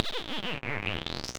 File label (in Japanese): 風船ひねり